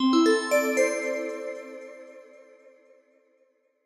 Melodic Notifi

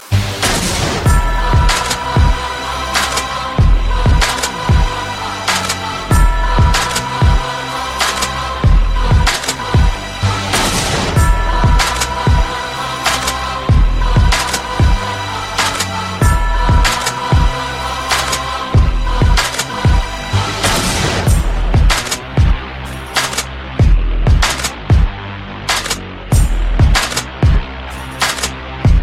Gangsta Beat

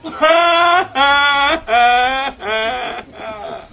Haahaahaa

